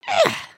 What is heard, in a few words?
generic build; emotes